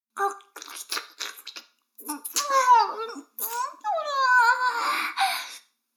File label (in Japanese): 耳舐め音声; 逆時間停止されて